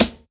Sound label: SD, Dance Mega Drums; Best Service